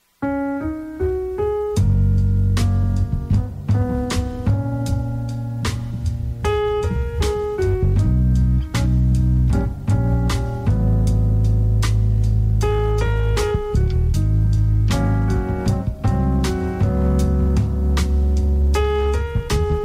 Jazz

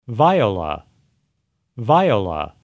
Viola

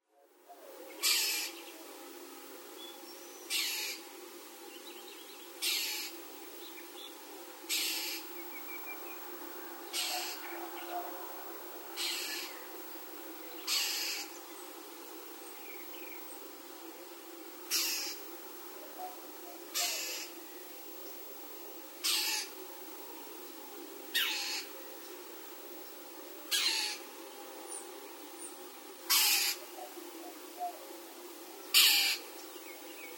young mocking bird crying for food

